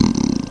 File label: grunt